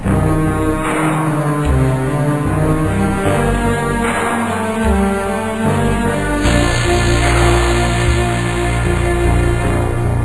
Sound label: tune; quake2; chaos; sin